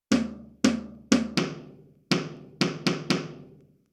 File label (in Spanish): Ritmo